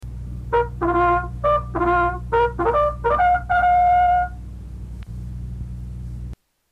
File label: Liberty Call, Bugle calls